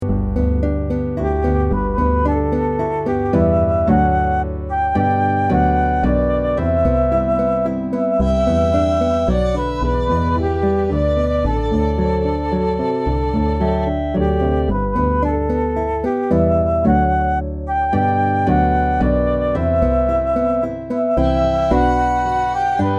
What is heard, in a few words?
Celtic style ballad